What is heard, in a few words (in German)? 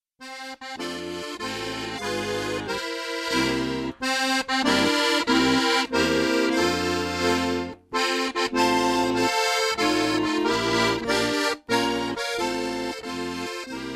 Happy Birthday Ein Prosit